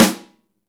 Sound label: SNR, Snare; Club-50 - Foundations Roland; Ext.Snare 1